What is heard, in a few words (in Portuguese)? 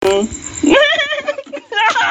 risada ladrao